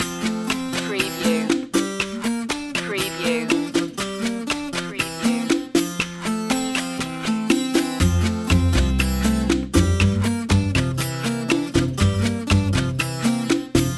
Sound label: cha cha cha groove